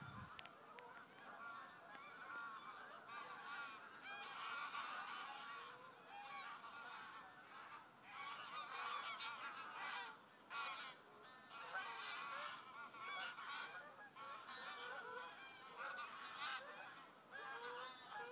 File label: geese going north